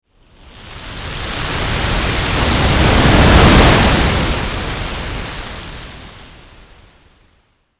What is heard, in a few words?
Meteor, Approach, Break, Atmosphere, Pass, By; 5.1; BlastWave